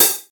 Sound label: clean urban open hat a sharp key, open hat one shot tuned to the A# note